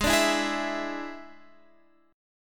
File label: D Suspended 2nd Flat 5th G sharp